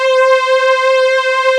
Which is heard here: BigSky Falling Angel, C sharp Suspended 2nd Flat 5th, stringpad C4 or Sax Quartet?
stringpad C4